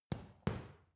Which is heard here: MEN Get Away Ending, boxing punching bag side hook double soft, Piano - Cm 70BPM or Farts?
boxing punching bag side hook double soft